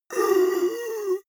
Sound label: monster inhale; kreaturen